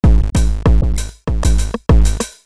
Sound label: robot march; esoteric